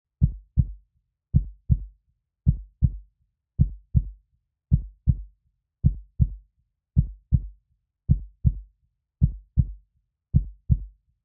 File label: blood flow